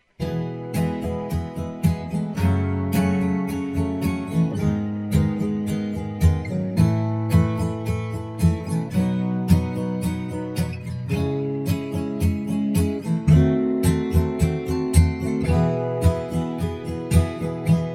E-Dur Akkorde

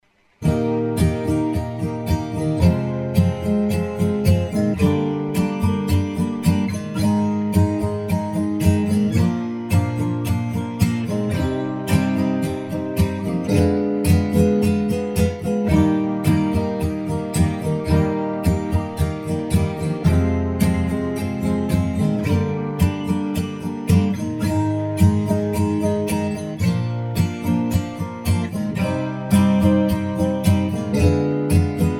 Eb Dis Dur Akkorde

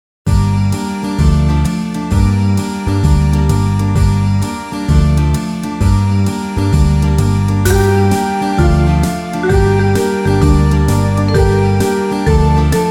Hejo spannt den Wagen an, Ukulele G–C–E–A Tuning

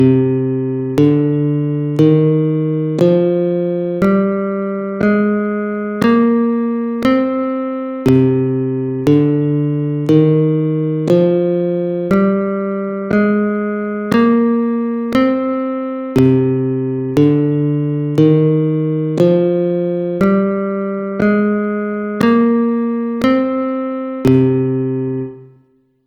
C Tonleitern natuerliches Moll aeolisch

